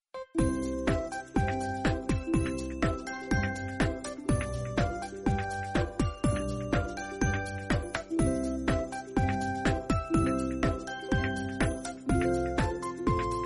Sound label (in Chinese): 主界面背景音乐